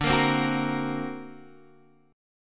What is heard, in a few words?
D Augmented 7th down Guitar Half Step Down